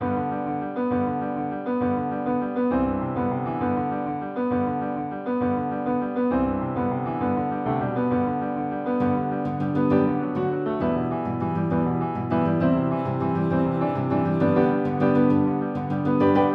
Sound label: Por Favor Instrumental; twelfth night audition material